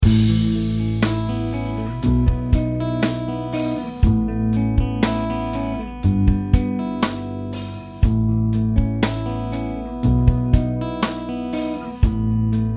Clean

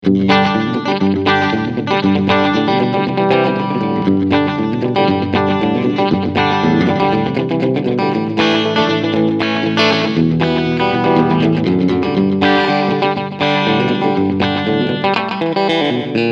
Slap Back Delay

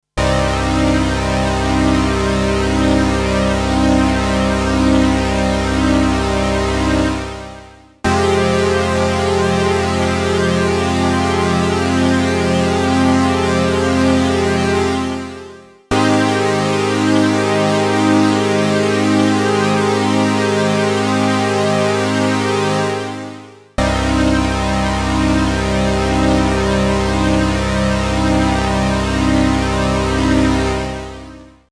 Boss CE-300 Juno Chorus I

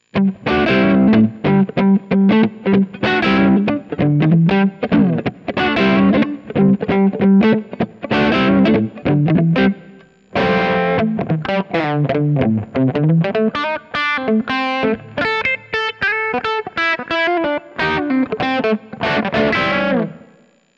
Neck to Bridge PU boost; George Benson

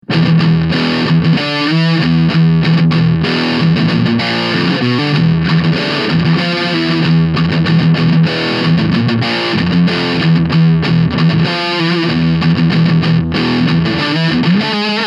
Mooer Hornet 30 Preset Pur

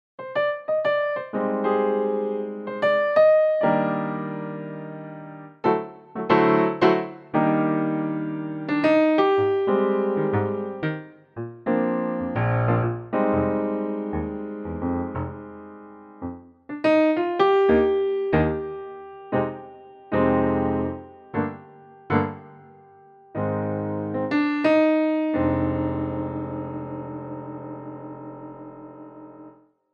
Yamaha CP88 Piano

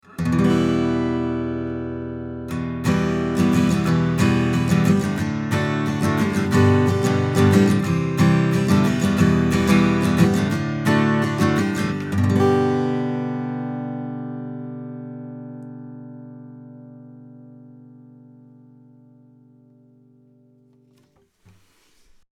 U67 Cardioid Bass Roll Off Ac Git Chords; RME UFX

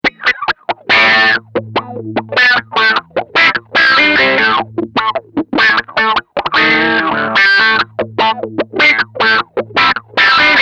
Neck Pickup BP

